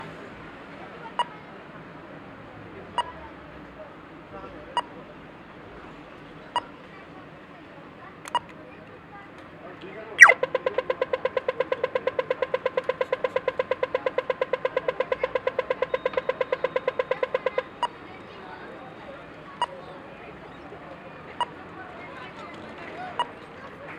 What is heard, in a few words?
Pedestrian Crossing At Traffic Lights